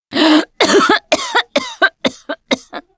Cough, russian

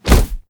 blunt hit low; Mount&Blade Warband

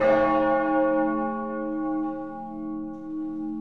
bell loop; ambient; AssaultCube; Alien Stars; American Muscle Series

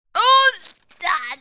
woman fall; Mount&Blade Warband

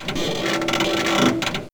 Jack Creak; AssaultCube; Alien Stars; American Muscle Series; MW Mod

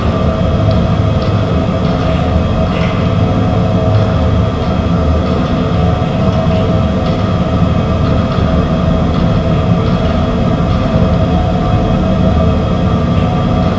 big pipes loop; ambient; Left 4 Dead 2

